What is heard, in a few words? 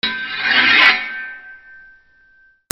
WEAPONS